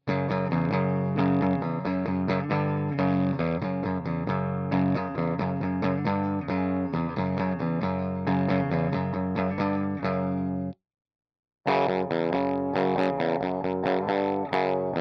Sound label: Celestion Greenback